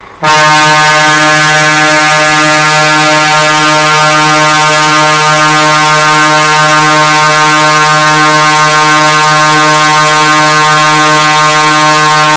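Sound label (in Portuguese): buzina de caminhão, truck horn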